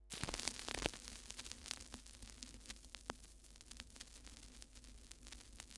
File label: Vinyl Crackles